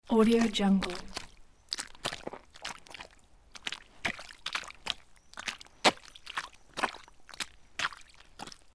steps in sticky mud loop

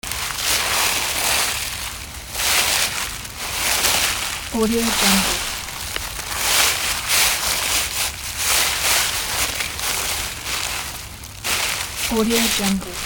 leaves walking; راه رفتن روی برگها

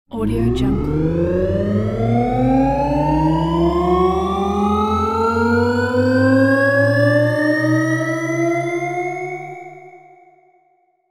starting mid spaceship; futuristic sounds; استارت زدن و شروع حرکت

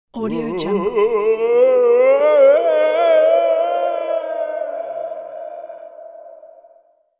falling man into well; چاه; افتادن مرد در چاه; human sounds

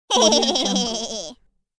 strange kid girl giggle; human sounds

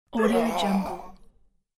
low male kill moan; ناله مرد بعد از چاقو; human sounds

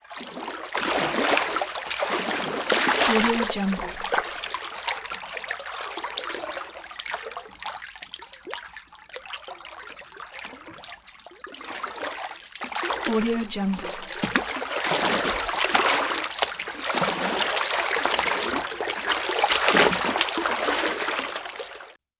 water splashes water swirl trickle splash; game sounds; شالاپ شولوپ آب

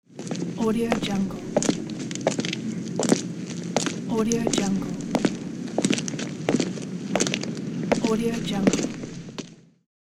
steps walking on pavement; قدم زدن در پیادهرو

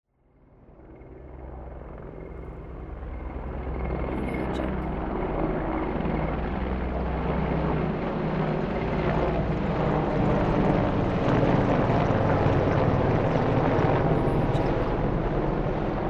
three military helicopters flying by

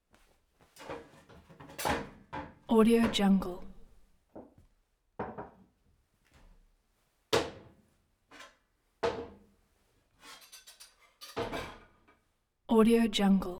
dish movement in kitchen; domestic sounds

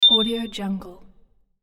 interface accept ping single glassy; interface sounds

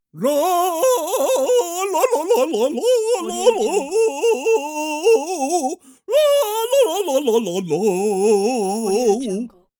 cartoon character singing off pitch

